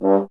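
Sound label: brass French horn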